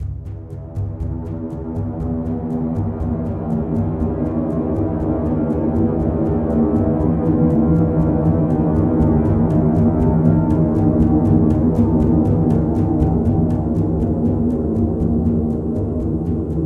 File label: Ambient Drums; kholat gamerip 2015